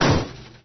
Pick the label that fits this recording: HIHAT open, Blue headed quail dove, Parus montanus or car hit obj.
car hit obj